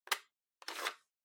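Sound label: Portable MiniDisc Battery Remove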